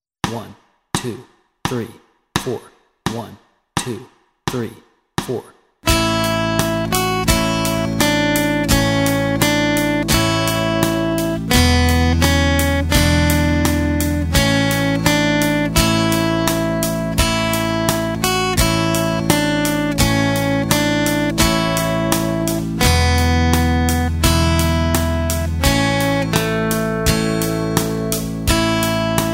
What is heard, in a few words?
London Bridge Melody and Chords Track, guitar